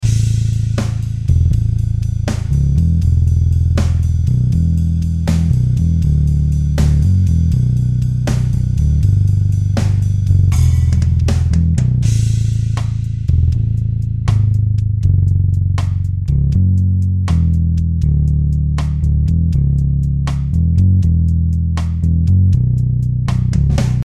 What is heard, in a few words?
Violinbass; MODO BASS; IK Multimedia